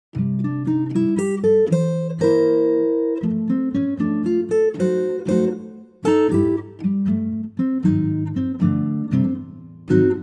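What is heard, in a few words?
C Acoustic Guitar; key - C - vocal range - (G optional) - A to C#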